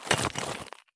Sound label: turn tile; Escape from Tarkov